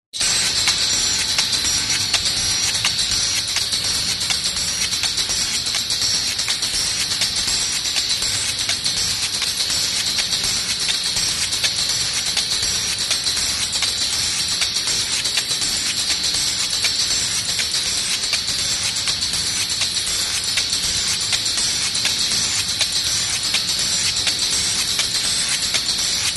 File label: Percussion